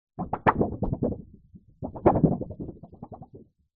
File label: foley paper sheet large laminated wobble